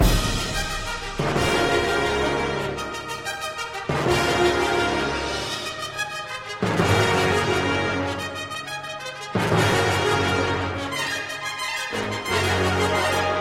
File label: Fanfare; Grand Canyon